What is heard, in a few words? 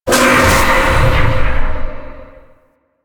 Spirit Release; Warframe; Zariman; Survival